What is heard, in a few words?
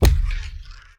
footstep; Barotrauma; Barotraumatic; Sentinel